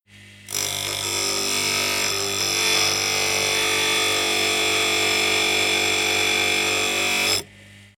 Industrial Bench Metal Grinder Run Long Substained Grinding